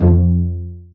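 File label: strings F1, String Pizz, AKAI S-1000; Retro Sampler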